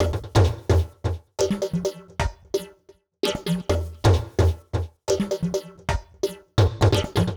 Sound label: Cn-Fx Loop 130, Perc Loops 130, Loungin House; Equipped Music